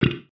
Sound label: weird, creature